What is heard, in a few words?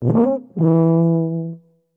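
sounds of tuba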